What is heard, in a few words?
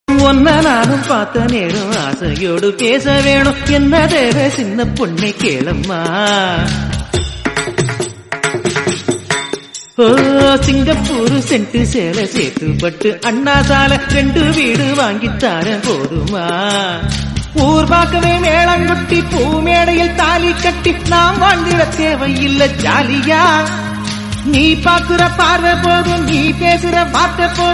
Tamil song Ringtone